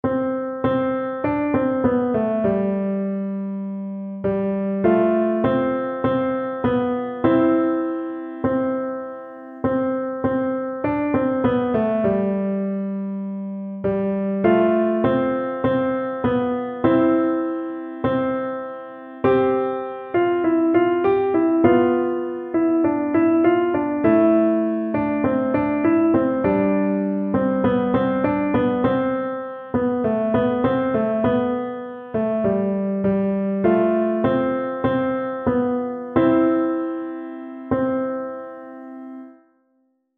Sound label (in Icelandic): ding dong, píanó; jolalog fyrir piano 2 hefti